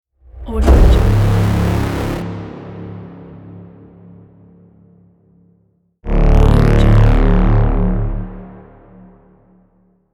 cinematic braams; dogma-m

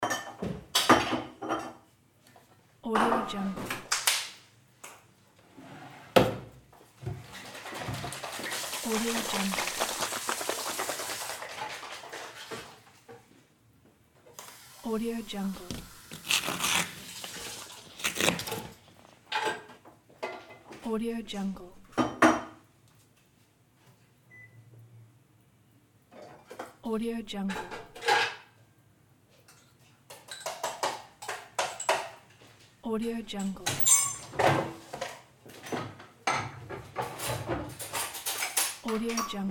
kitchen ambience

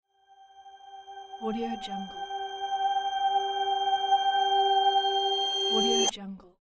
rising airy ring dead stop

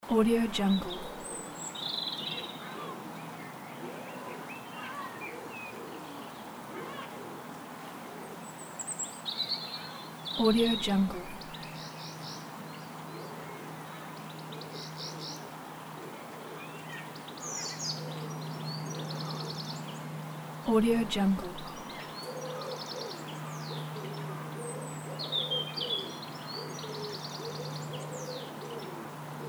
scottish summer garden ambience; f m audio

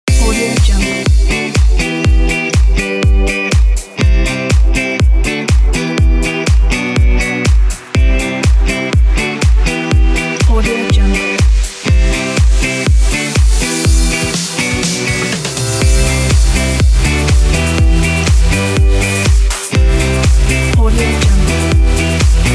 fashion pop party

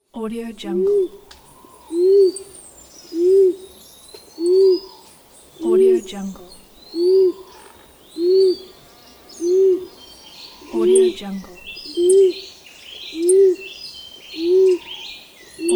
pigeons

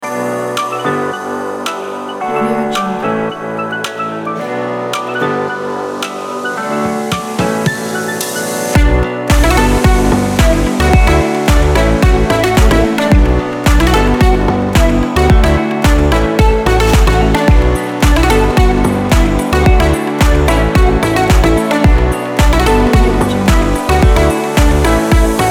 summer travel

